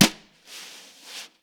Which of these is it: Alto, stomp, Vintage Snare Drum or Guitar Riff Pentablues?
Vintage Snare Drum